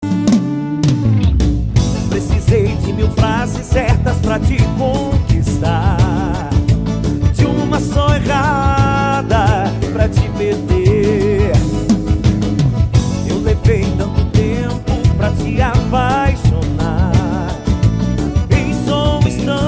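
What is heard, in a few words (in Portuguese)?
especial sertanejo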